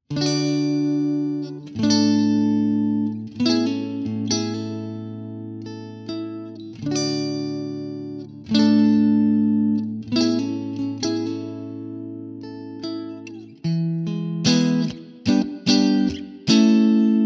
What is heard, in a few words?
clean; jensentone